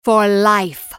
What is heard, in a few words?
ultimate effort; Soraka; en US